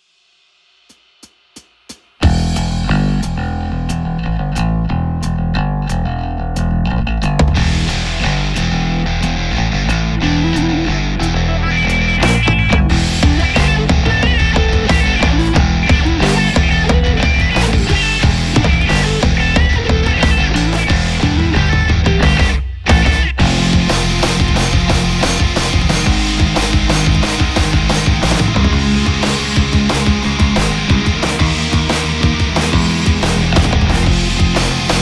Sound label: ROCK Gallien-Krueger 1001RB